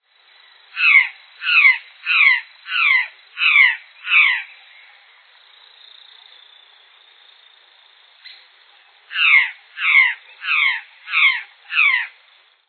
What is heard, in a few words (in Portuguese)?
gralha-do-cerrado